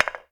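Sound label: Skull Stone Hit; moul-assets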